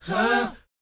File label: BOYZ, Vocal, R&B Dance; Spectrasonics Vocal Planet